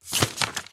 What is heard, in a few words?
tile flip